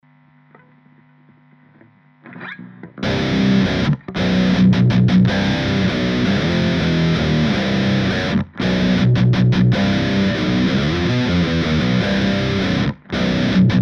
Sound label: Treadplate Fireball, guitar; podHD500